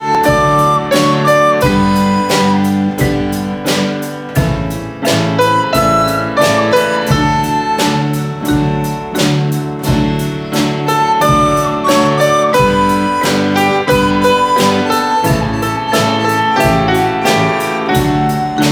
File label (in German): pausenglocke